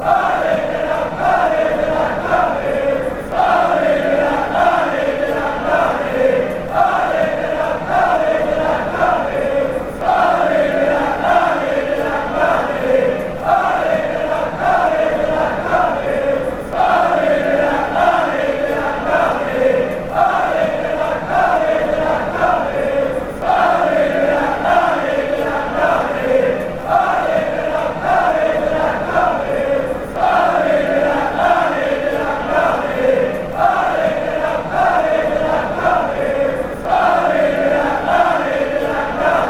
ale, fan chants

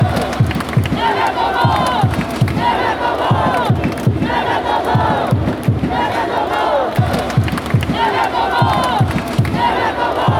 japanese chant

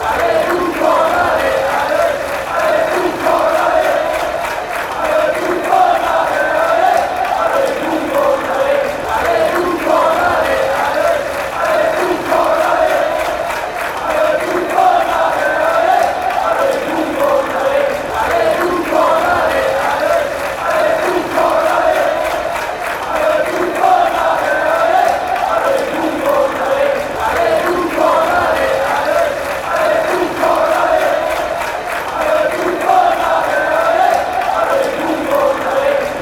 ale ale ale, fanchants; buffon

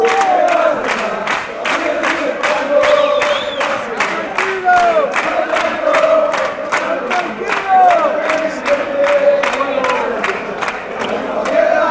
with you, fan chants

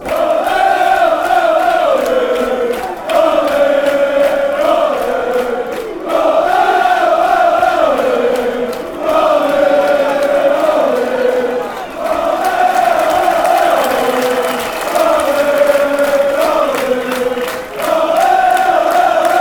ole, fanchants

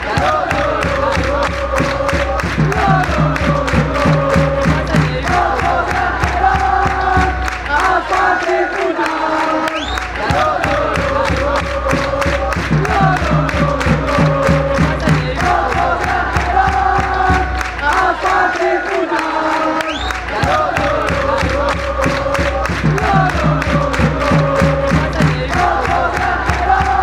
animar hasta reventar, chants

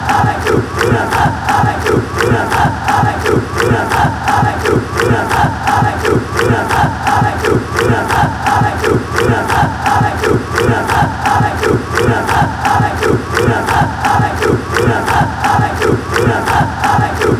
ale, fan chants

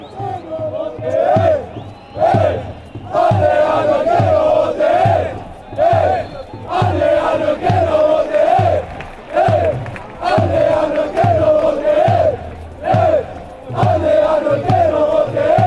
hey hey fan chants

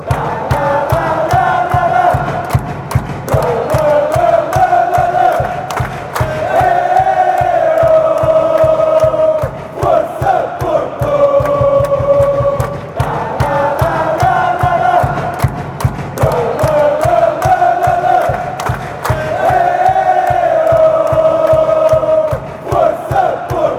go porto eh oh, football chant; fc porto

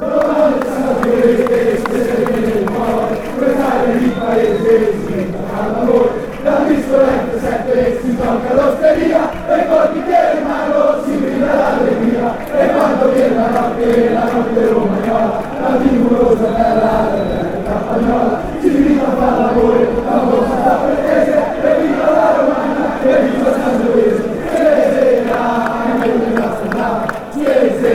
happy fanchants

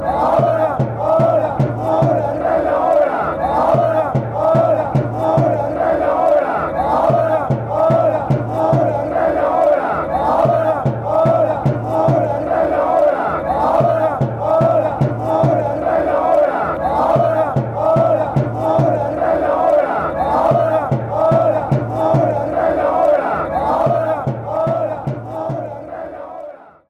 now, chants

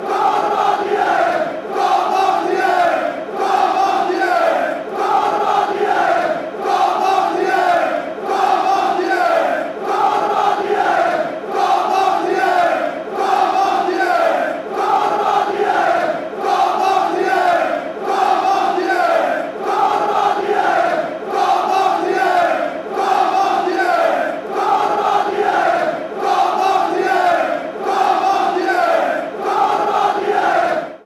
den haag chant

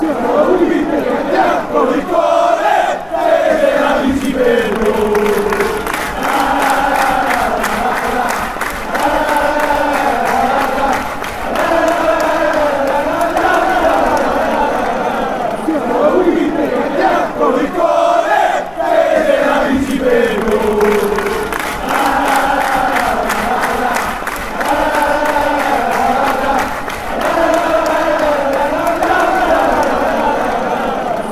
lalala

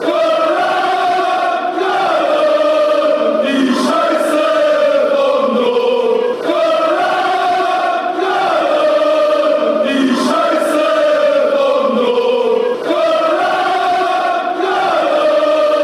chants; cologne

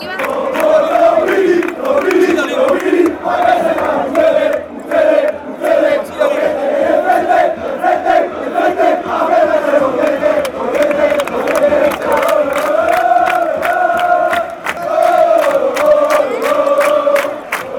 in front fan chants

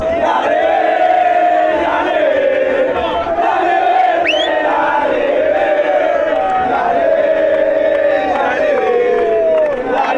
come on green, chants

